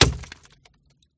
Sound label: axe impact; the sound pack tree